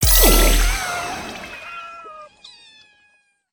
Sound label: Celebrate; foley